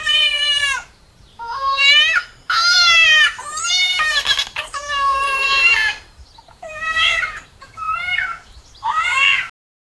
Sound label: kitten crying walking on